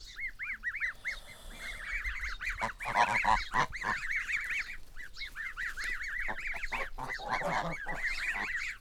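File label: Anser domestica juvenile; Goslings' squeaks and calm gaggling of their parents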